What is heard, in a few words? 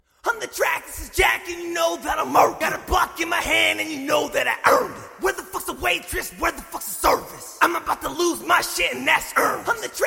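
trap hip hop, 100, E flat minor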